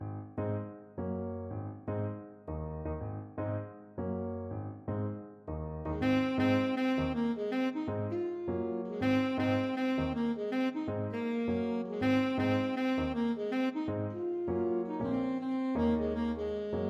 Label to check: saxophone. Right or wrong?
Right